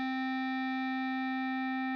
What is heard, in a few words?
Clarinet